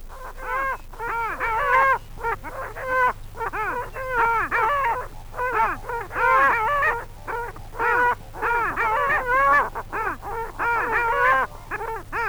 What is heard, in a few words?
clangula hyemalis